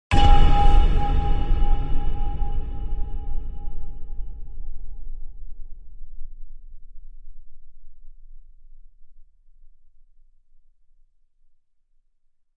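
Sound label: Impact Metallic Clang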